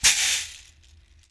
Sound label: Berimbau Shaker, World Percussion; Sony CS